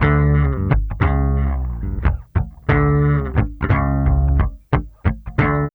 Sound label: BASS, Funky Ass Loops; East West